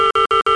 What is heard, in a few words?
Yellow Alert; Covert Action